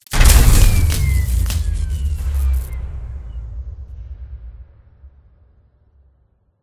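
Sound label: power short circuit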